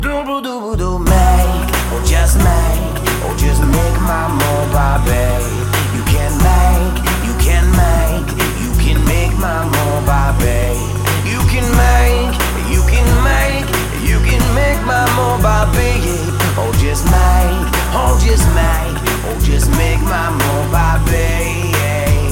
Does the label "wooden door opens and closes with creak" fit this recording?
No